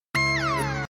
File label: sweep effect